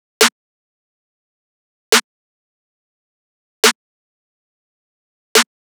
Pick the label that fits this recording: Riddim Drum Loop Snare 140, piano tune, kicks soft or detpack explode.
Riddim Drum Loop Snare 140